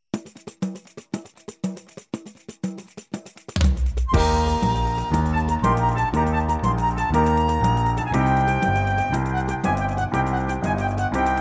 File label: F flute reference